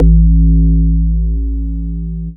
bass attack, techno trance

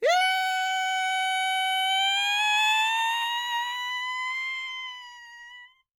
YELP, World Voices, ANDES FX

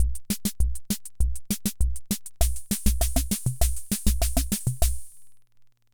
Hammond Auto-Vari 64 Loop, drum machine

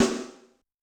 Amb, Yamaha DTXpress-II snare

